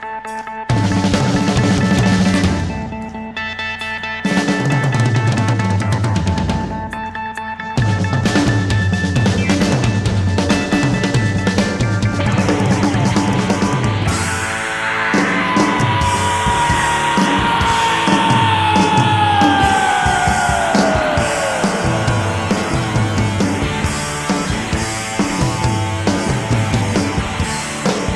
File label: Drum Solo; West Palm Beach-09-24-00